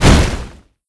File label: boss crack; Super Trench Attack